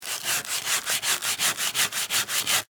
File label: Sand Paper Wood; Hand Tools (Sound Effects:Tools); BlastWave